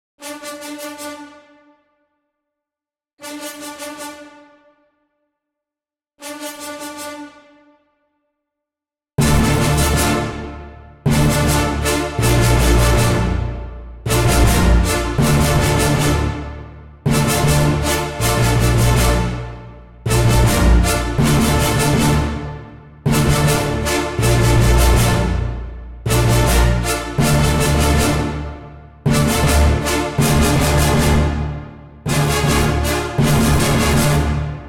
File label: Tutti; ProScores